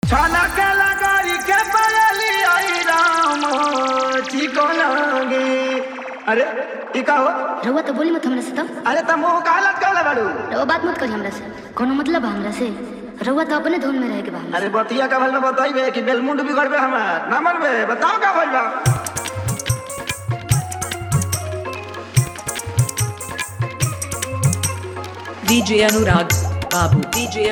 Hard Bass Jhan Jhan Toing Bass Mix

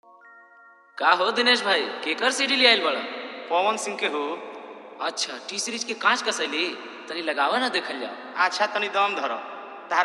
Full Dhollki Dance Mix

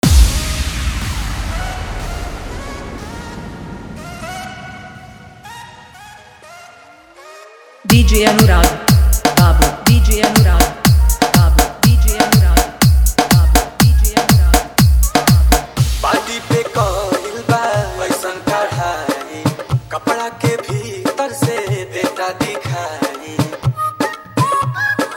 Dhollki Mix; Marikpur

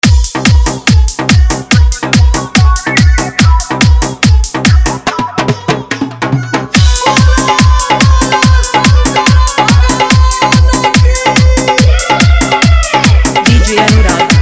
Bolbam Mid Dhollki Bass Mix

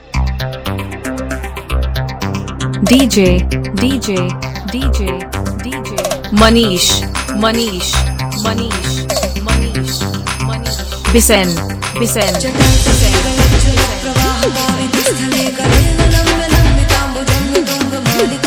Super Dhol Bass Mix

